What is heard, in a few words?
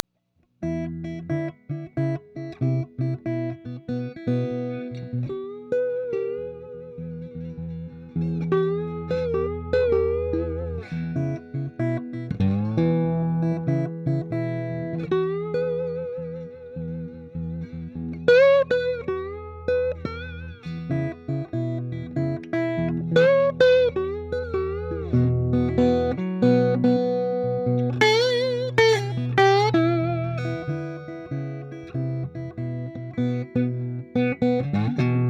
Blues; barnyard; playstation 2